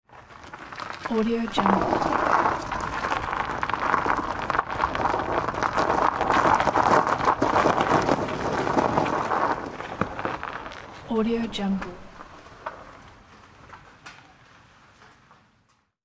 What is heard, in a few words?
tires rolling on course gravel